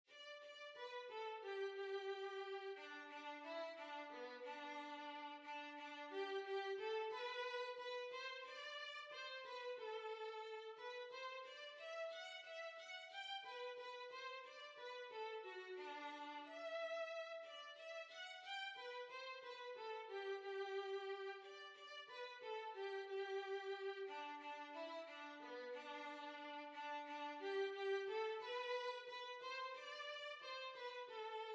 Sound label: Violin